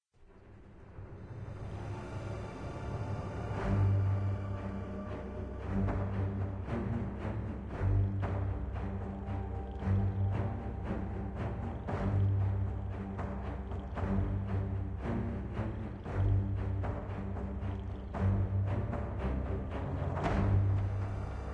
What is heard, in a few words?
player turn spooky